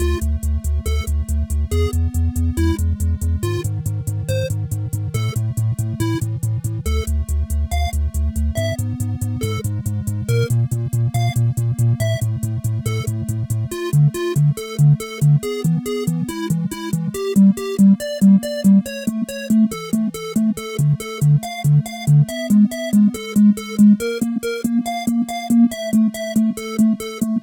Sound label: main battle music; terrorbane